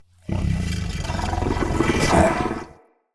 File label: Corrupted Battle Dog Idle Growl, Animals, Vocals